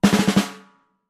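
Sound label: Drums Snare Short Crescendo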